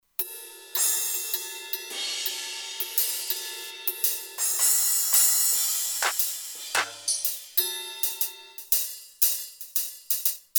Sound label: Jazz Kit, Kurzweil K2500X; Factory Programs